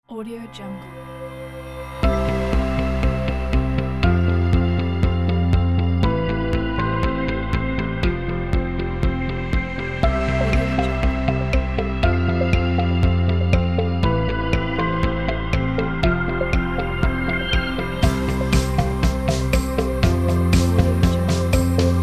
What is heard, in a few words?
emotional positive world